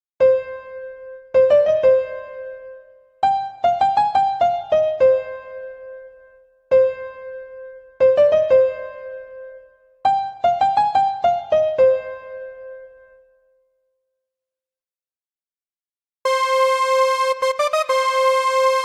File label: bgm piano; krack; raviteja